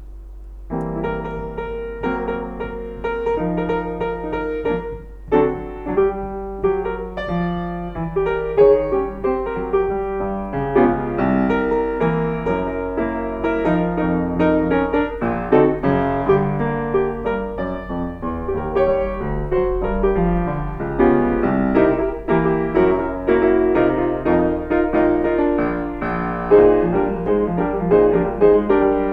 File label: Steinway Model K Upright Piano